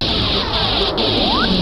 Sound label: HIP HOP; Rubber Chicken Software Sample Bank